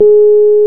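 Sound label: piano Rhodes Mk.V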